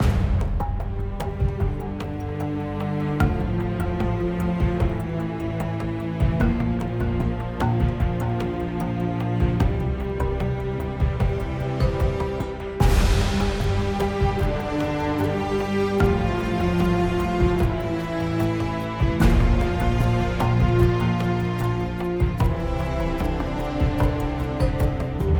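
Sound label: Music Percussion, Percussion Post Race 75bpm; Forza Motorsport 6 Apex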